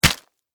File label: Impact Splat Juicy; Splats; Objects